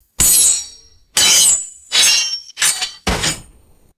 metallic sword hits and scrapes; weapons

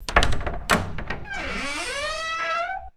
old wooden door unlatches and squeaks open; doors

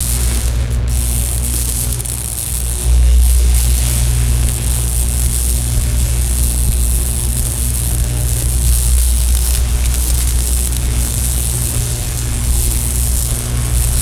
heavy continuous electrical arc buzzing; electricity

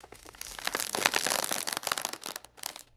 plastic bubble wrap rustling and sliding

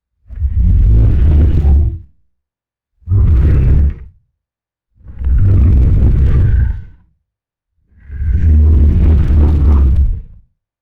deep resonant humming bursts and groans

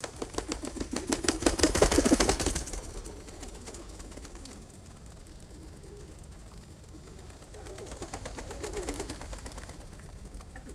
fluttering pigeon wings and echoing rifle shots

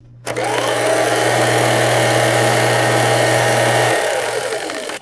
electric sewing machine motor running

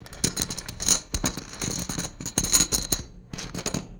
plastic poker chips clattering and sliding; foley

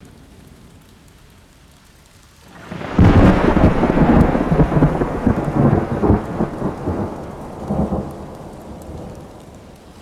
deep rolling thunder and light rain

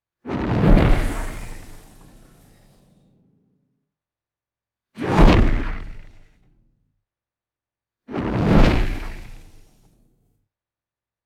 flaming arrow whoosh and impact

